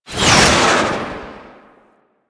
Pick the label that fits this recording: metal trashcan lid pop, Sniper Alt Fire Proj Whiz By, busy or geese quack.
Sniper Alt Fire Proj Whiz By